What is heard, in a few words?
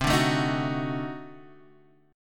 D 7th Flat 9th C, chord